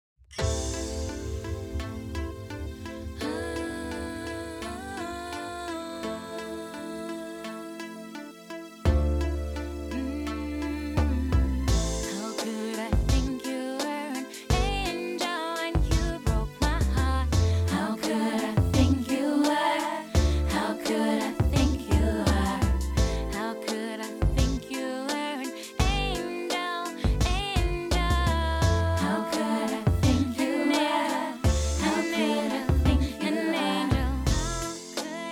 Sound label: mixdown uneqed; eiosis aireq premium